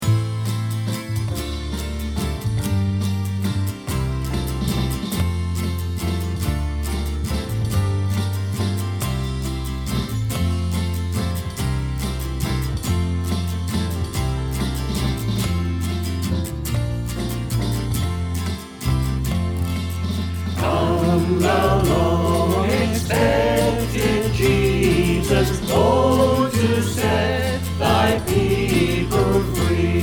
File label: choir; Come thou long-expected Jesus